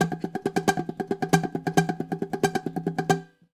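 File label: bouzouki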